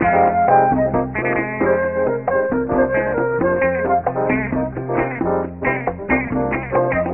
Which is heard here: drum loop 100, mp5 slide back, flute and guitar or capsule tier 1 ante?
flute and guitar